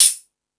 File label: Space Drums, E-MU Pro-Cussion; drum machine